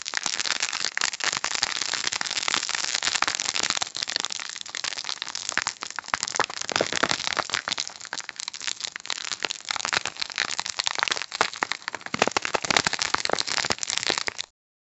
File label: organic intracellular, biology